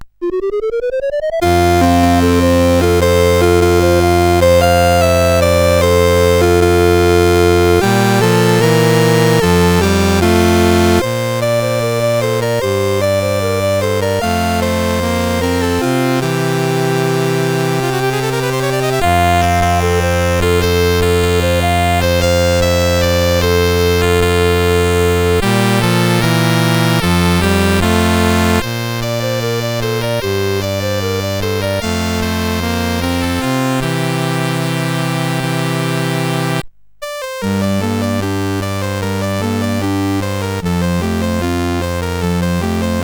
CSG 8580R5; Denver Sound; hvsc

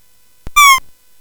Space Gun

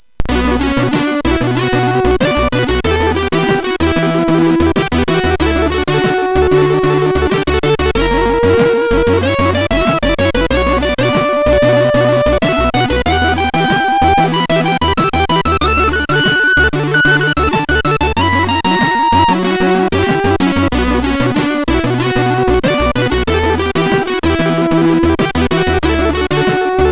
DISCO, MOS6581; MOS6581R4

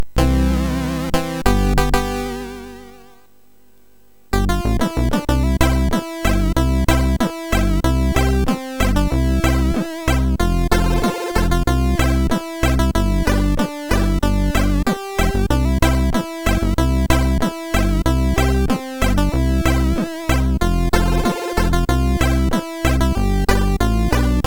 Jingle Bells, sid MOS6581R4

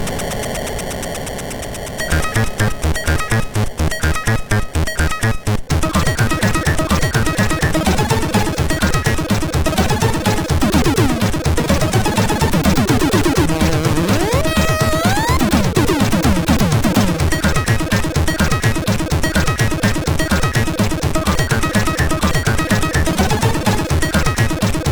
Heavy Beat, sid MOS6581R3

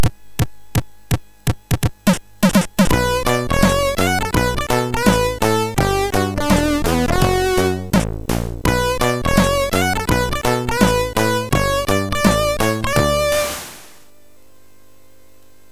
Jingles, MOS6581R3; hvsc

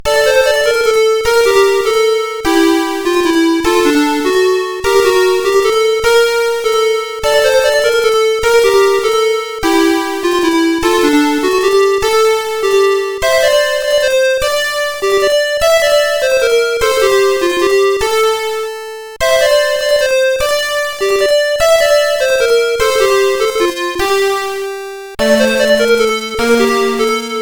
Gameover Tune, sid CSG8580R5

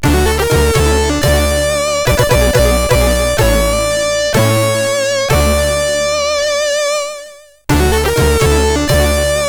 Fade In Intro, CSG8580R5

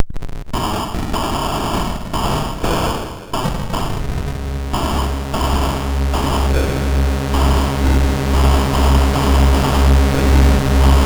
Battle Tune sid MOS6581R3

